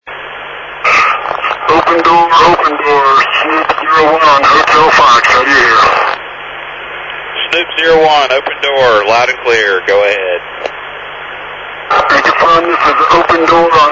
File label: open door